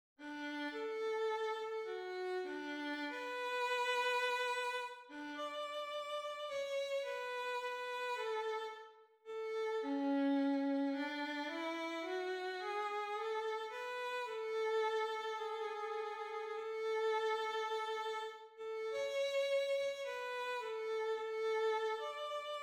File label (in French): hymne national danemark; kra yer hans ernst